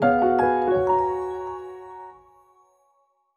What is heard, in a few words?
Jingle Finish; clubhouse games 51 worldwide classics 2020 switch gamerip